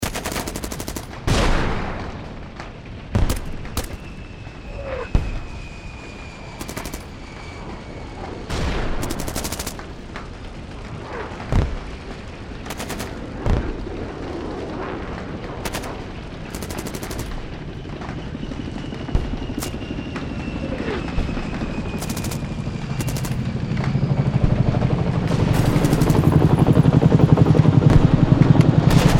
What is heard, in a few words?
Battlefield, Ambience, Modern, Warfare, Loop; 5.1